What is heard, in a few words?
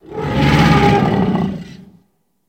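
Dinosaur Roar Short Burst; dinosaurs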